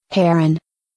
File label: heron